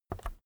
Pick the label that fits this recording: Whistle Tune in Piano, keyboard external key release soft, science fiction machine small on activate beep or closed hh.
keyboard external key release soft